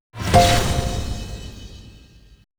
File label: Ribbon Hit